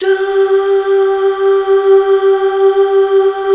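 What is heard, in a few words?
DUH, Female; BACK. DUUHH; Sound & Vision - Gigapack II CD 1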